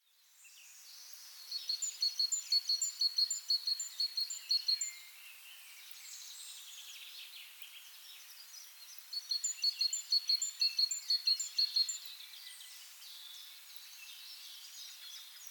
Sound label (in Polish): glosy ptakow bogatka ti ti ta